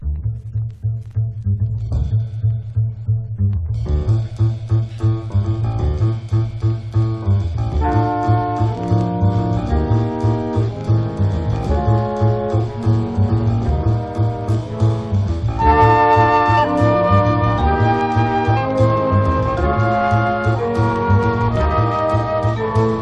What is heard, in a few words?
jazz waltz